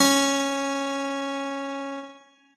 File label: piano; pianojs